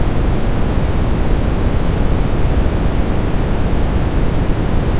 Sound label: drive, artillery; nalquas